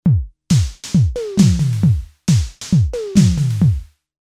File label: Tama TS-305 Seq Manual